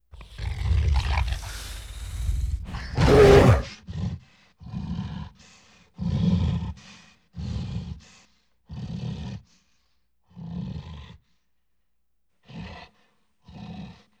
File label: Gorilla Snarl And Roar